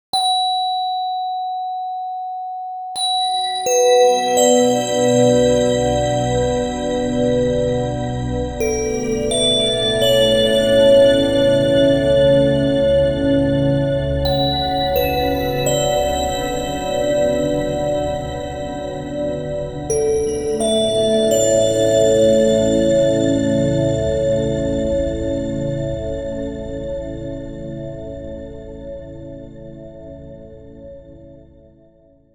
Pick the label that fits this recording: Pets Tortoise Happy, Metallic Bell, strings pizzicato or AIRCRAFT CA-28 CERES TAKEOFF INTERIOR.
Metallic Bell